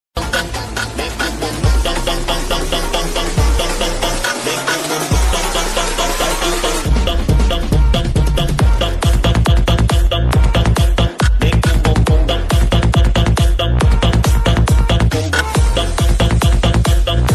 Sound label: psy trance, trippy psy